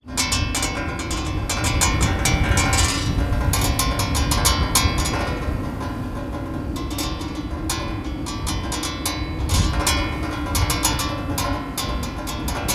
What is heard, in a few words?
nature wind strong gusts howling metal fence squeak rattle; felix blume